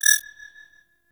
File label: Library Scanner Target Acquired